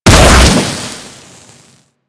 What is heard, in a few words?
Auto Crossbow Fire Charged; Warframe; Tenno